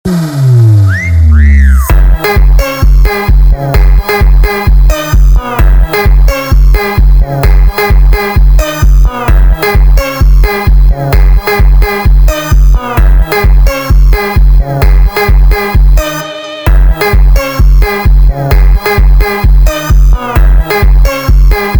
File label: Funk